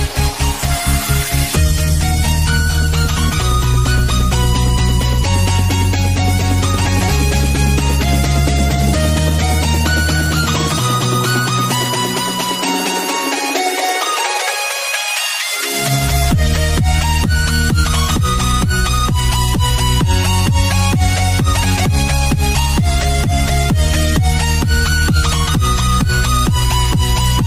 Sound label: trap English music ringtone